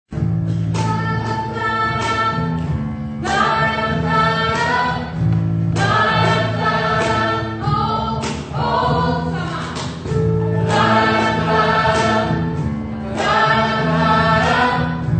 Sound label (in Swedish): bas, stammor